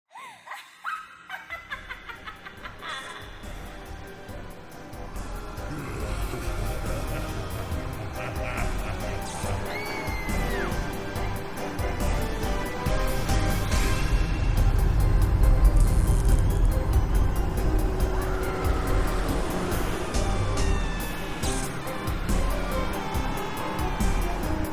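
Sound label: Nightmare Ambience with music; Carnival Cat Resource Tracks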